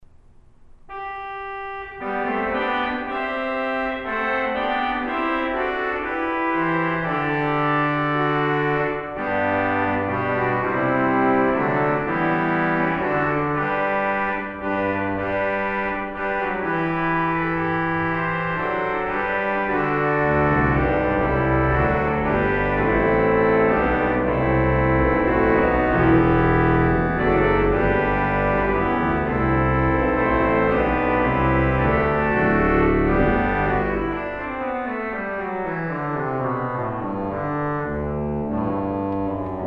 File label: trombone